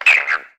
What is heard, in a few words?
Forest Amb